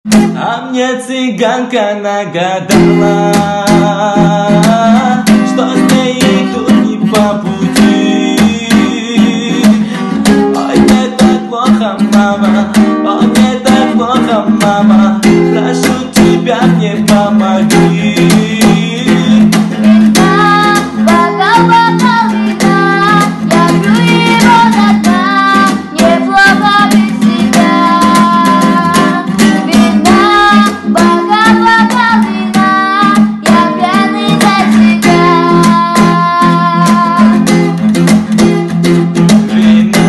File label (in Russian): Gitara